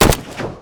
sniper dist; weapons

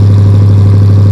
low, engines; DEMON

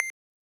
push

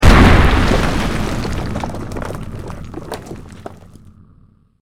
tnt

